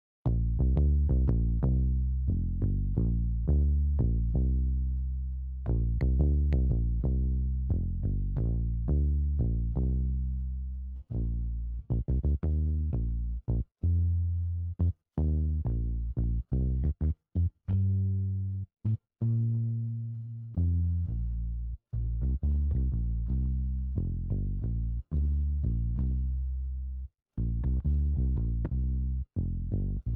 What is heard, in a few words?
bass